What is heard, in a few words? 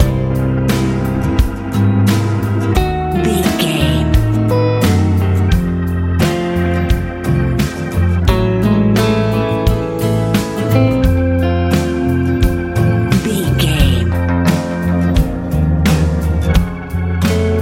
easy pop